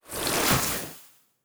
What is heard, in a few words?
Nature Spell, Magic Sounds; Fantasy Sounds Bundle